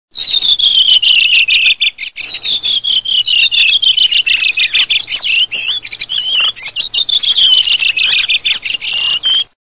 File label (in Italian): SASSELLO